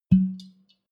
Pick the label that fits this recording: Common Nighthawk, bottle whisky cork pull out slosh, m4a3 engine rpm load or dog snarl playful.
bottle whisky cork pull out slosh